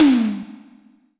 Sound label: Electronic Tom